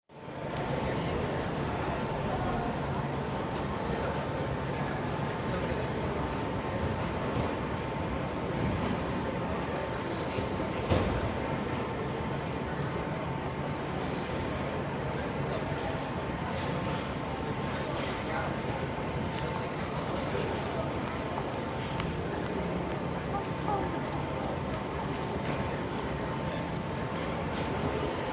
Airport lobby, close to check - ins, conveyor belt rumble; Terminal 4, Heathrow, London